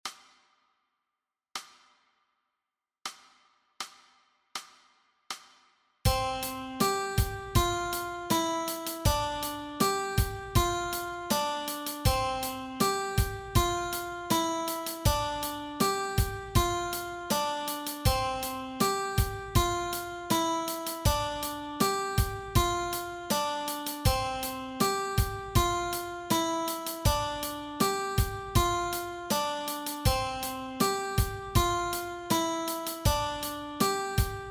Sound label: Guitar Exercise